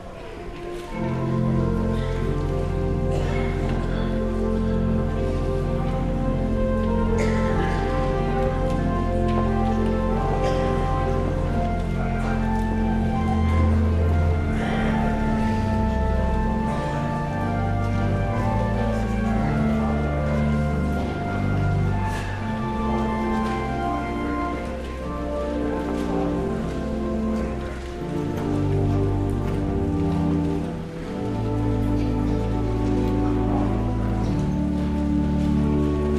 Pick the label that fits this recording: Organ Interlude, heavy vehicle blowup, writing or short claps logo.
Organ Interlude